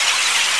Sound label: Crowd Murmur; SNES; Super RBI Baseball